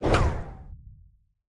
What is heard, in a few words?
ricochet